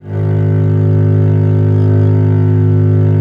VL G#0, VIOLE, Historical Instruments; USB Soundscan vol.23

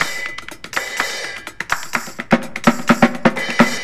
pared perc; Zero-G Deep House; 125bpm

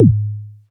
kit tom; E-MU Formula 4000 Series Vol. 2; OrbitPercMaster

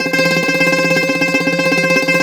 BZ TREM C 4, BOUZ.TREM, Mediteranean Traditions

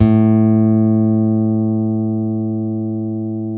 STEEL, Guitars; Spectrasonics; Hans Zimmer Guitars VOL-1; PARKER STEEL

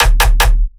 bass hit blasting D#; Virtual Riot

